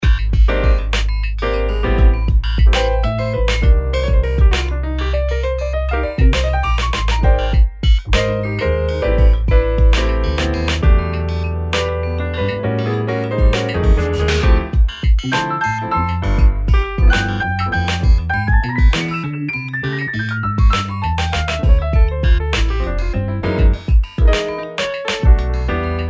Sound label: Glitch Machine Piano Solo